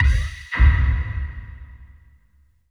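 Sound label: drop snare, perc fx; Zero G; Malice In Wonderland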